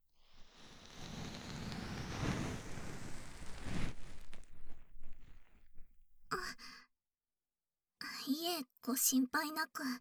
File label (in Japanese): 左 耳かき パート